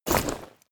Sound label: step leather light